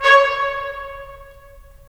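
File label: brass trumpets staccato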